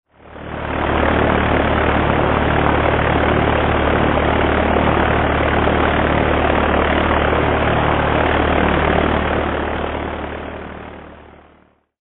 Sportflugzeug

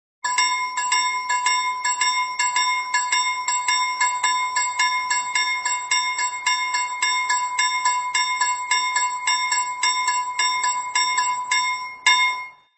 Bahnüberg

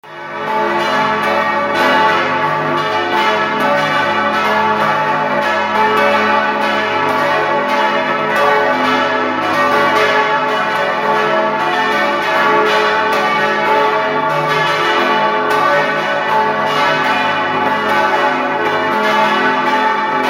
Dorfkirche

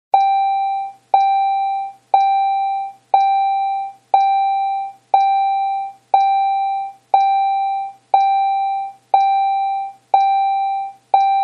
Bahnübergang dong dong

